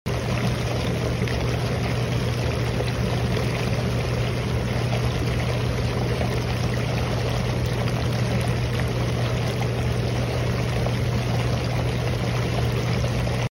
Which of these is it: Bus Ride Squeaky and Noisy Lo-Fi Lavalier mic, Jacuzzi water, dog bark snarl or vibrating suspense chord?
Jacuzzi water